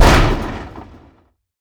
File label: Touch In, Damage; DCS